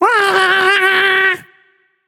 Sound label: tongue, voicepack; smite; jorm